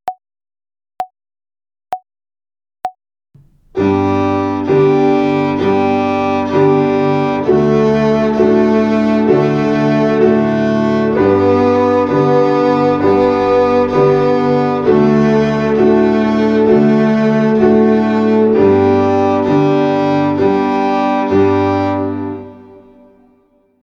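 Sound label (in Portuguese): Ginástica até o dedo 2 - Corda SOL - Quaternária, Violino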